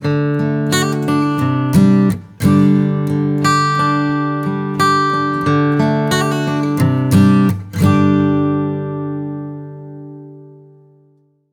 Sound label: Acoustic, ringtones